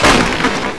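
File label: gargantua stomp; svencoop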